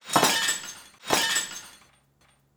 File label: glass sweep